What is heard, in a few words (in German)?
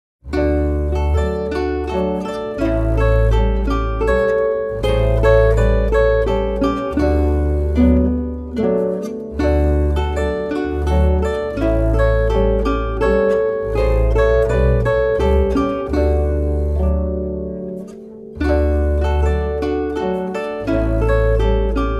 Bauernmenuett